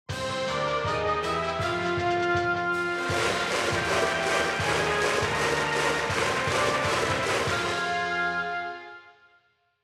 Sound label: Ouen Brassband; ebaseball powerful pro yakyuu 2020 ps4 switch gamerip 2020